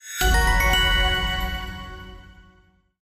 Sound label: scatter win; fireflies